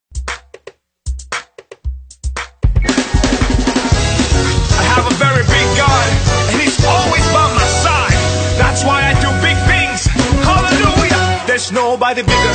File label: Gospel Afrobeat Mix